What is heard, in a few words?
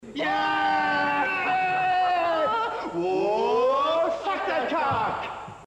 yay